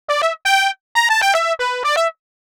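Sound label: trumpet solo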